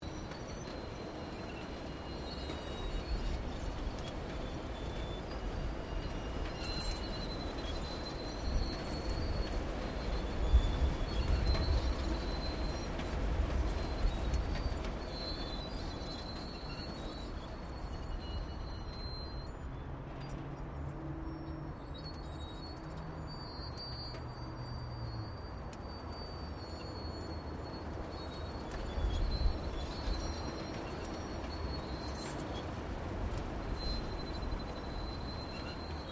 SCULPTURE MOVING WIND DRIVEN OPERATING, Sculpture that moves in the wind; Weather